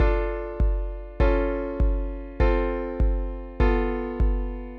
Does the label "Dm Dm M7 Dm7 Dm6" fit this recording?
Yes